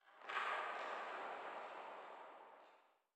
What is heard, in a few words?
cave crumble; children of morta